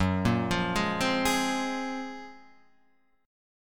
F sharp Major Sharp 11th F sharp Guitar Half Step Down